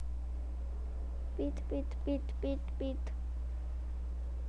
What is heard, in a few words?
beat